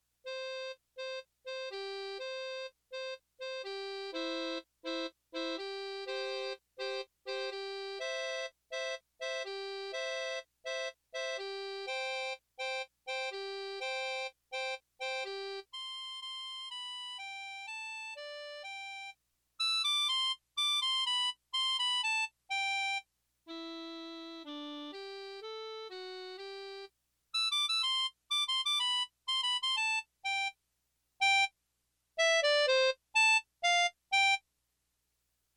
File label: Baroque Hoedown, Harp only